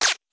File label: laser